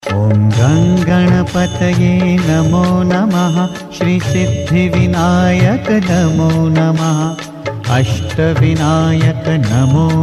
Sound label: ganesh dhun indian ringtone; bollywood